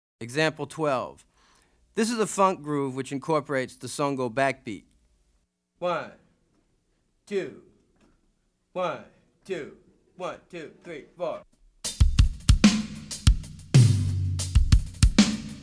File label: Afro-Cuban Grooves For Bass And Drums; Drummer version; Goines, Ameen; Chapter 2, Example 12